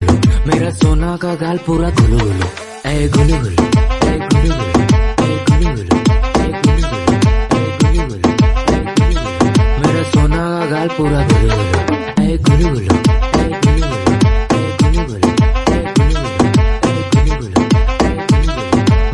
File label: Romantic Ringtone